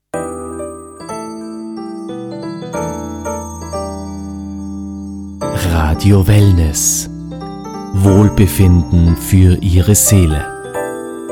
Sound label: Jingle